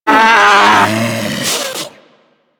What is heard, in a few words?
Predator Vocal Death; Warframe; Hunting Critters